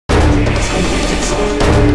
Fight; Silas; The Da Vinci Code; XBOX

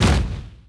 boulder land dirt; impacts explos

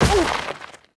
FALL, Rider

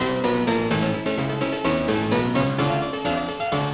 piano; Ambient; MX vs ATV Unleashed; XBOX

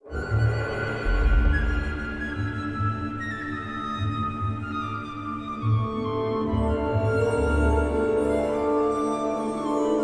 suspense, music

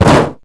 metal hollow, footsteps; left; characters

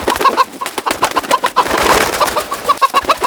Chicken Walk; PowerUps

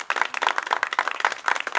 crowd; gfinale

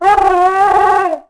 Warn, Dino; Ourano

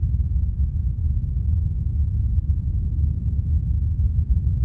vent huge; Star Wars Knights Of The Old Republic II; XBOX

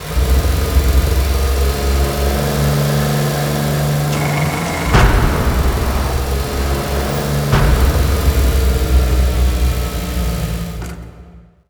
ramp drop; oklahoma

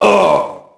merc get hit; enemy; Max Payne

